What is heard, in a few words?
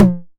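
909 high tom; Goldbaby Tape808 & Tape909; The Tape 909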